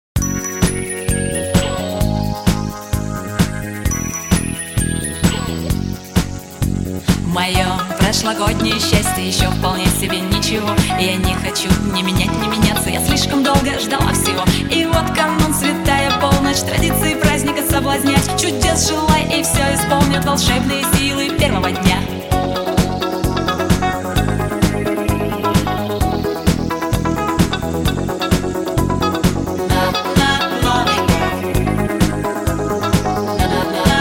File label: vocal remix